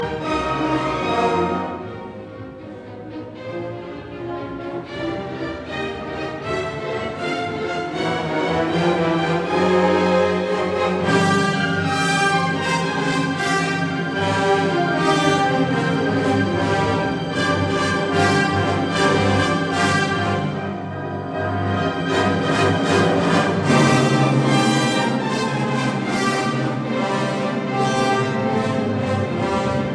Symphony in E major Scherzo Sehr schnell Trio Etwas langsamer; Bruckner; Otto Klemperer